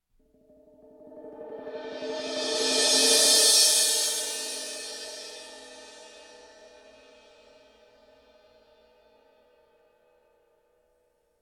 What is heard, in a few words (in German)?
Drums Percussion Beckenwirbel